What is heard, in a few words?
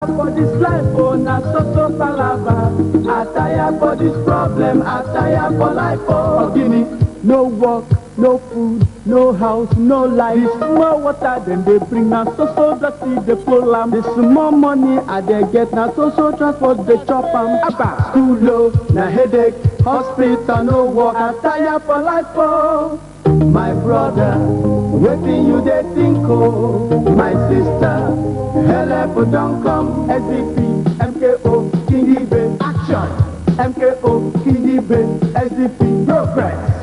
jingles; Hope 93